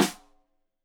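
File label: Snare Stereo, Drums; Deeply Sampled Drums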